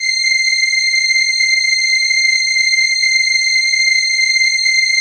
HIGH STR, House Dance; 90 s Sample CDs; Best Service Dance Mega

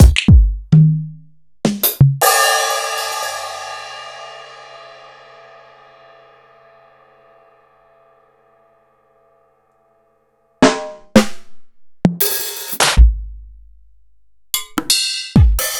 EMU PX-7; m8

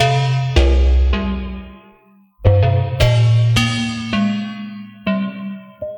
Melody, IDM

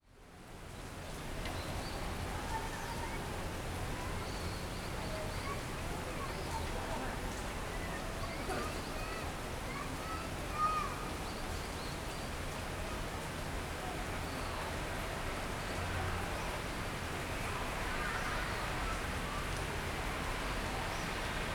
Rain, Concrete, Rainf; M8

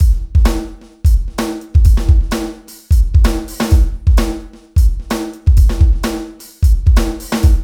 BEAT 129

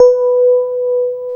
PIA DX E-PIANOS

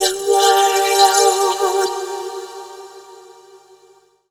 VOICE FX, Dance Vocals

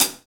Pop Closed HiHat

